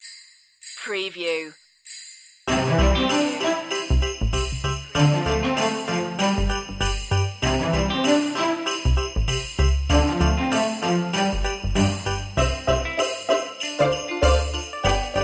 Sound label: festive funtimes no drums